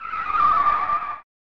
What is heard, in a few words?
Skid; Kawasaki Caribbean Challenge; snes